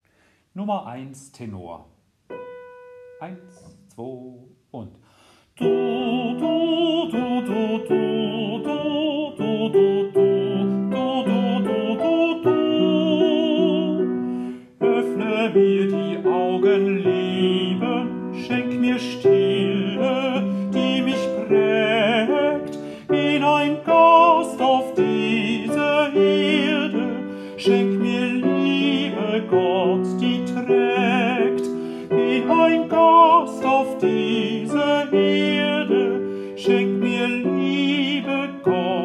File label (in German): Tenor; Dioezesan Caecilien Verband; DCV Chorfahrt; Übaufnahmen